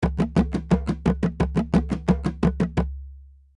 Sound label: dry drum